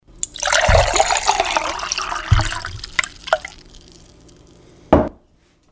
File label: Water Pouring